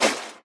swim; smite; poseidon

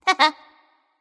hehheh; smite; voicepack; thanatos

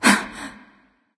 surprised; smite; voicepack; skadi

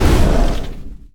arcane activate; smite; god merlin

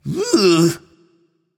"ugh"; smite; voicepack; janus

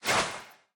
swish small; smite; god artemis

